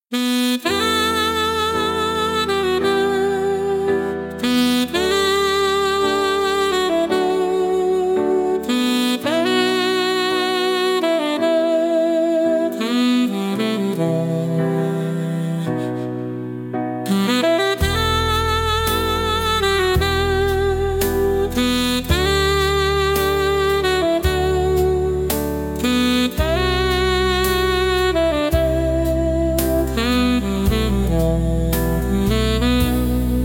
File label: velvet saxophone